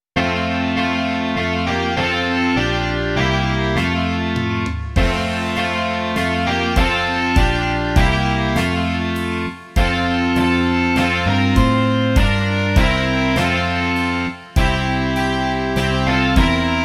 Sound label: rock